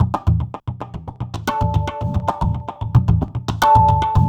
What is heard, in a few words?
TABLAS; Zero-G; Chemical Beats